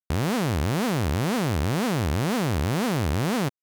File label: LFO Triangle pitch 12 st rate quarter 120bpm